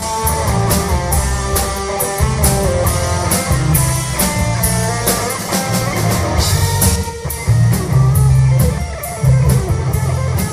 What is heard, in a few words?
two Filters and Compressor